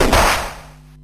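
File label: battle hi damage; Etrian Odyssey I HD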